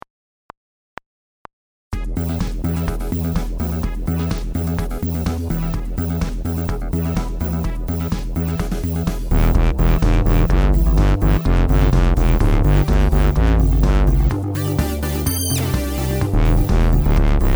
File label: dubstep